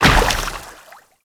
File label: Moa combined step water; Warframe; Footsteps